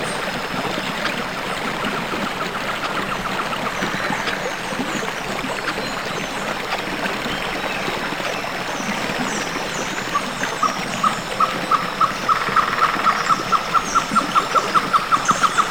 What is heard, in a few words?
Jungle Stream